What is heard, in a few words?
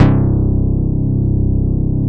FURIOUS BA, Bass Analog & Synth, TECHNO; USB Soundscan vol.12; AKAI